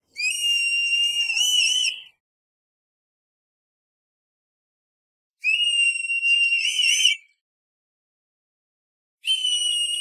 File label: Red Kite Call, Screech, Bird of Prey, Hawk; BIRDPrey; Jake Fielding